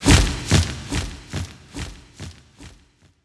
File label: Bahro Wings